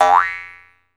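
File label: Jaw Harps, Pitched Percussion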